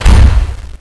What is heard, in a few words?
CLOSE